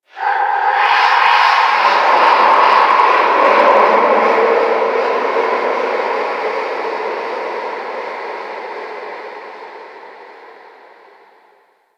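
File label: Distant Cry One Off, Shipyards; Warframe; Grineer Shipyards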